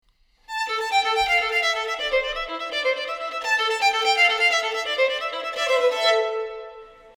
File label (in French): Violon